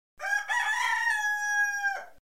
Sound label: Rooster, alarm sounds